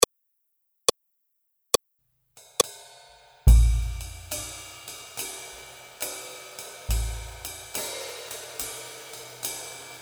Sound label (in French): Accompagnement seul